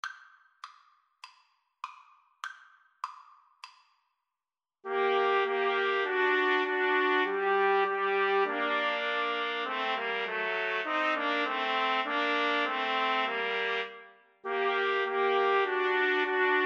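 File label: trumpet, karaoke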